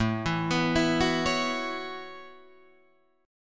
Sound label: A 6th Add 9th, Guitar, Drop D; 7,7,9,9,7,9